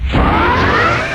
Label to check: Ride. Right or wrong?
Wrong